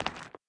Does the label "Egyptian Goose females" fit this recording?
No